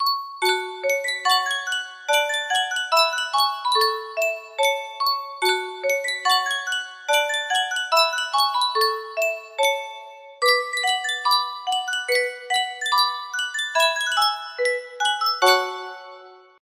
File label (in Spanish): sankyo caja de musica